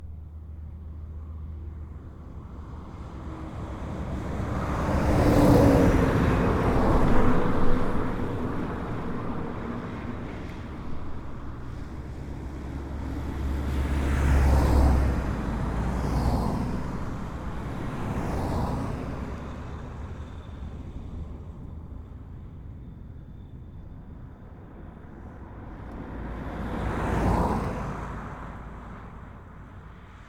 Truck, Cars, Vehicles, traffic, road, rural, wave, moderate speed; MKH8040; Pass-by; Sound Sower